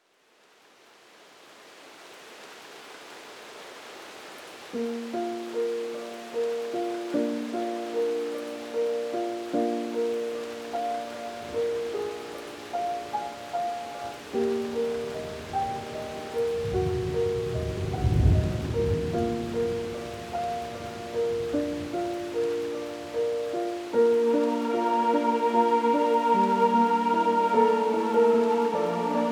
classical sleep music with nature sounds thunder sound